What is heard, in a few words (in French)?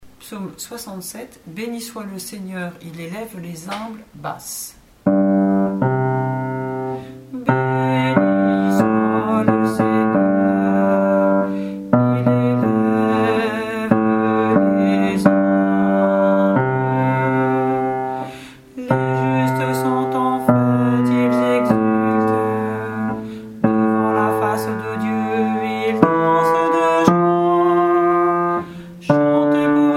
PSAUME BASSE; 67 FONSALAS Béni soit le seigneur il élève les humbles